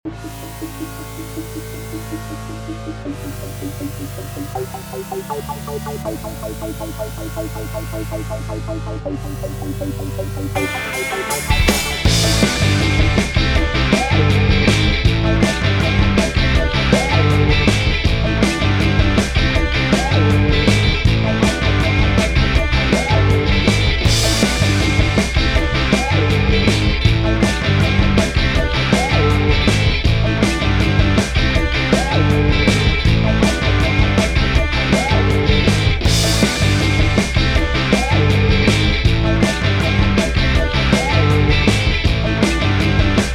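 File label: rock n roll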